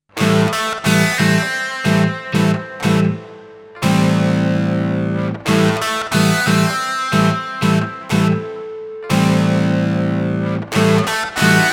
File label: Rocky Jingle